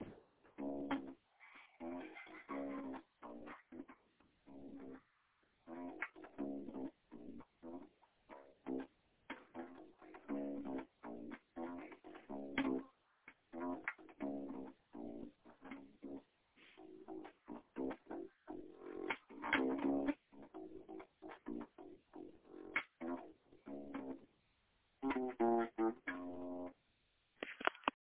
syncopated funky ish bassline

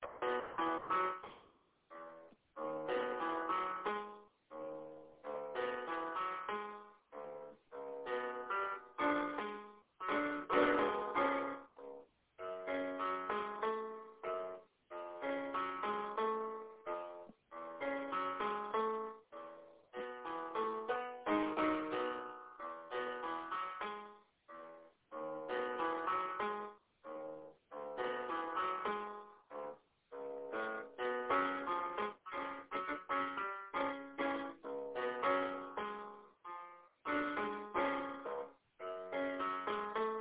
piano part musical

